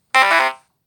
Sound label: Chord low, ringtones